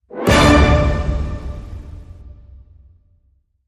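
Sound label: Hit Orchestral Glam D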